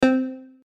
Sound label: signal